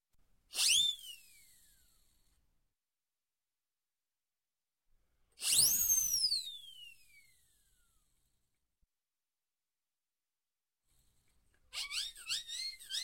Whistle, Comedy comedy Whistle; Sound Ideas 2000 Series

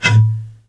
Blow Bottle Mortar Shot; Weapons

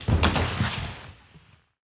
Bodyfall Tile; SFX Kit; Bodyfalls

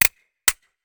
Musket Foley; Sound Ideas; Guns

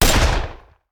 Machine Gun; Sound Ideas; Guns

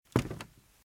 Footsteps - Wood - Creaky - Walk - Right; RadwinIsland

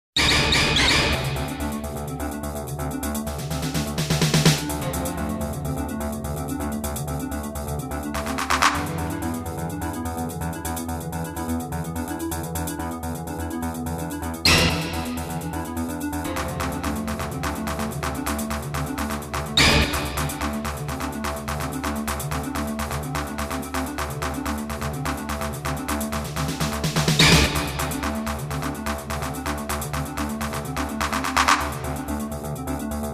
Jingles; 90 DDD Jingles

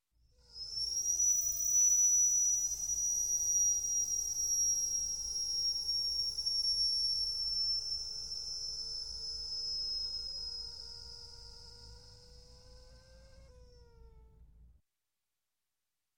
Hospital Heart Monitor Monitoring- Heart Failure; Revived; Sound Ideas 2000 Series

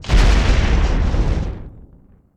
Explo Small; SFX Kit; Destruction

